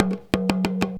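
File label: small tama groove; Best Service; World Colours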